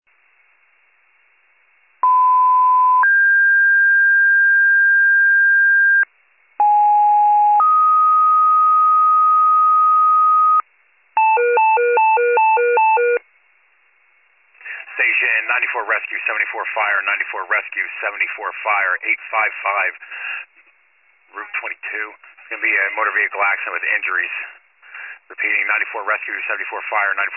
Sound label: fire, pager alert